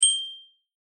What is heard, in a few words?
precipitate short high bell fifth higher; phet io wrapper sonification; molarity